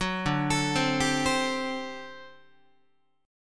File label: D 11th D, down, Guitar; D11 arpeggiated; JGuitar